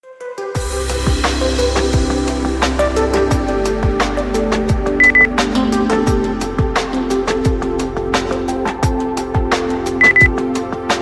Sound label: lounge